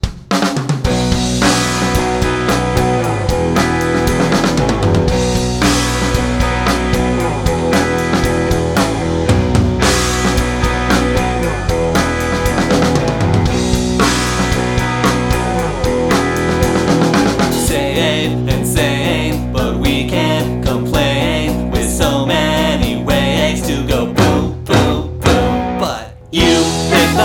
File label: Piccolo